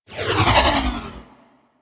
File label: Ball Projectile By; Warframe; Missions; Tenno Ball